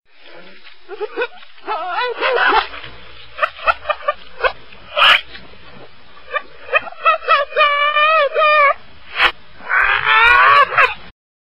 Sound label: crazy laugh rev